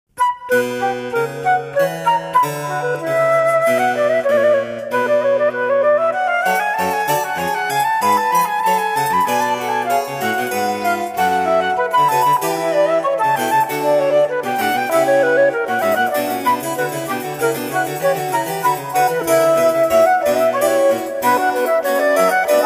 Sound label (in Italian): flauto allegro; albinoni